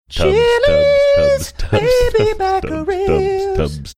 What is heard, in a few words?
singing tubs chilis tubs tubs baby back ribs